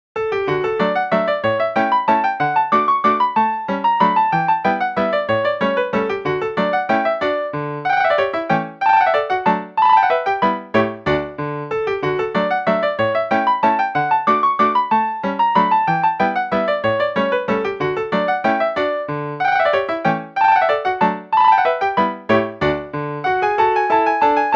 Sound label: Minuet, ringtones; Philips Xenium-E518